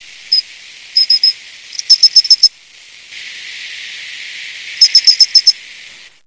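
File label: poor pine cricket; matsumushi; poormatsumushi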